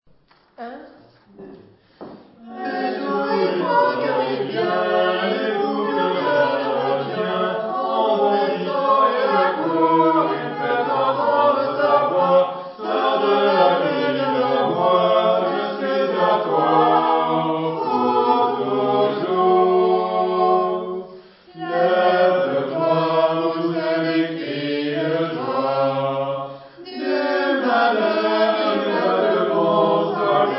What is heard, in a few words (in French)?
Plusieurs voix